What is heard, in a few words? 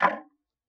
Tick, Clocks Kitchen Clock